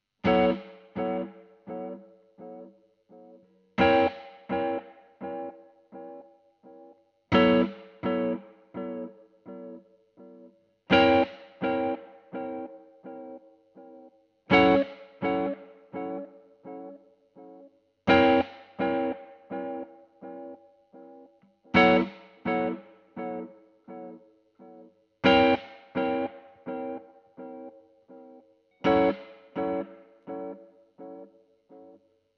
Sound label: GTR Royer